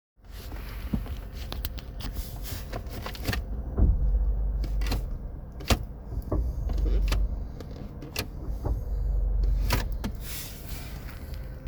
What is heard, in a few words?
Clunk Sound Dodge Avenger; 2008 dodge avenger se 2.4l; 203008 miles; scottykilmer